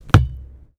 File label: ball hit